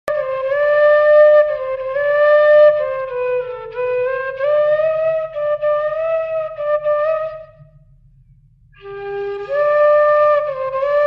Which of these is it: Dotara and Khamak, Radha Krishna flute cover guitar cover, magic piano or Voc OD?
Radha Krishna flute cover guitar cover